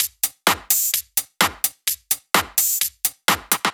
Drumloops 128BPM

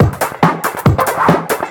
Breakbeat; Vengeance Samples; VEC2 Loops

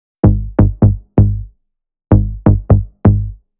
Bass 128BPM; VFX2 Demosong

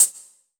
Open Hihat; Vengeance Samples; VEE Cymbals

